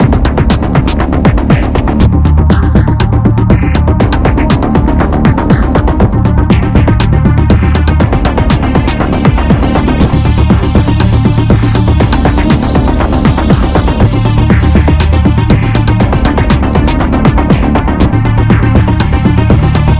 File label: Music Electro Combat; Synthetik Legion Rising